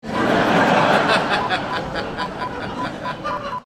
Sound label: Laughter